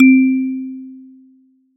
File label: first button; puzzle blocks